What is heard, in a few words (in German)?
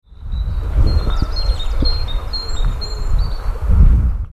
kohlmeise